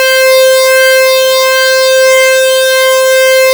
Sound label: Synths C; vengeance essential club sounds-3